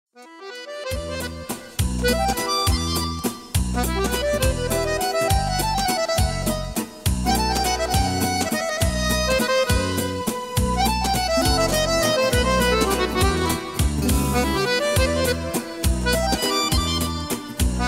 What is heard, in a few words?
akordeon music